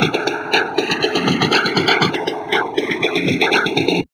DIST, Drum Loops Crazy Processed; USB Soundscan vol.07; AKAI

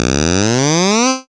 synth c, Techno Synths

